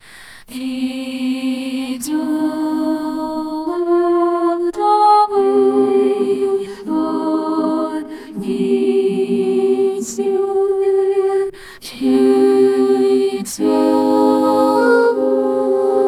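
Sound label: opera female voice singing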